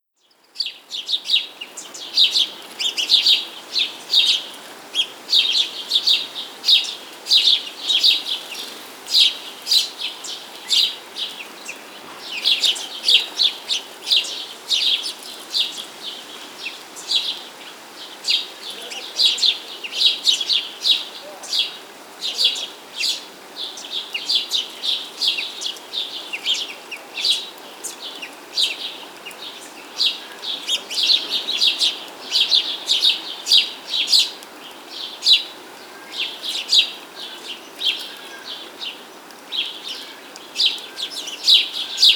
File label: House Sparrow